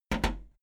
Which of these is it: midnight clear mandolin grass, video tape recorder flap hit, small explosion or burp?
video tape recorder flap hit